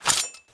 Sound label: knife draw